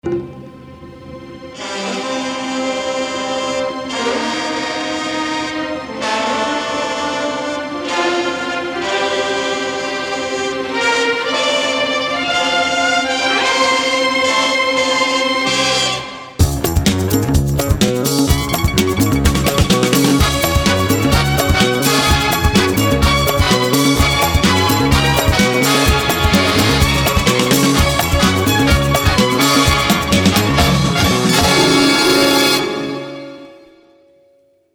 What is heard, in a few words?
ringtone